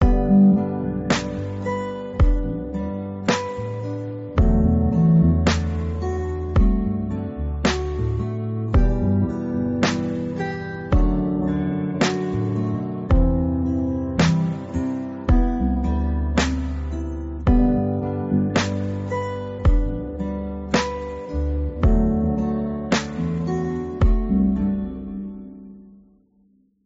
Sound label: bgm